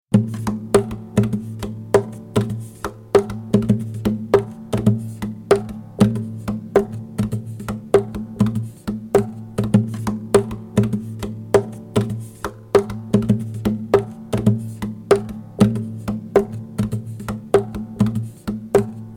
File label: D 100 GTR PERC LOOP